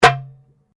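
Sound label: Drum Darabuka Slap Mute mezzo forte, Egyptian Darabuka Drum; Commanly available Egyptian Darabuka Drum; Musical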